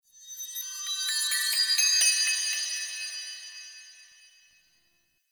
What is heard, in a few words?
Chimes; Warframe; The New War; Narmer Outpost